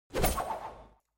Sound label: Throwing Knife